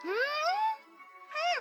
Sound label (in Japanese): んん～！？